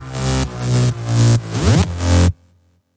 House Beat, Sting

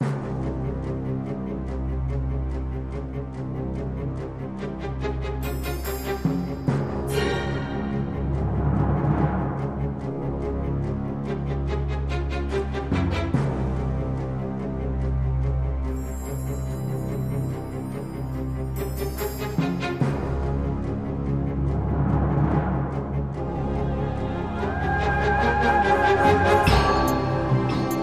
Tension

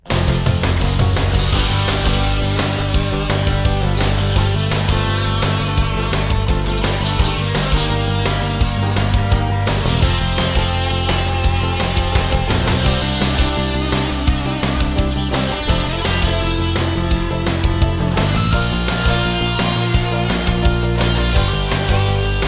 Rock

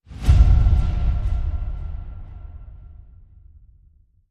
Hit Low Sweeper

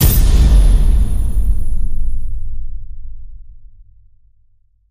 Hit Big

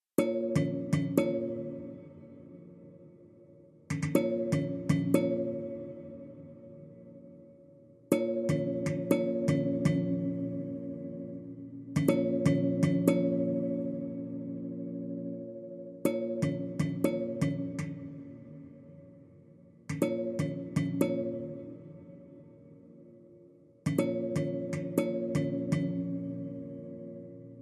Cat And Mouse Tuned Percussion Only

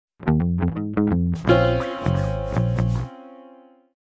Lounge

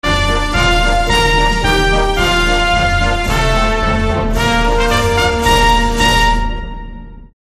March, Sting

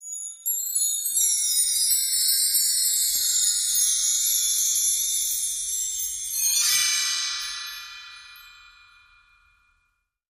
Fairy Dust

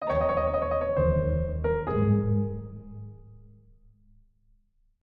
Piano Concerto, Sting; Piano Concerto 5

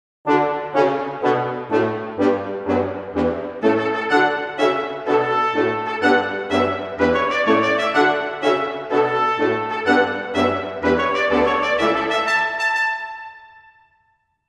Little March For Brass Band

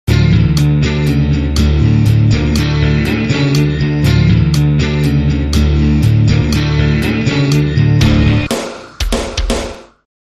Slow Motion

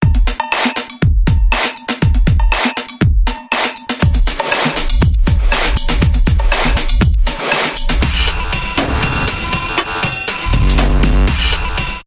G Tech Dance Bumper Reversed

